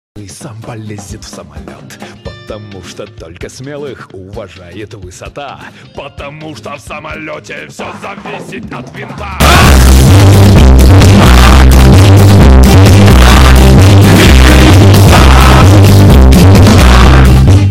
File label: bass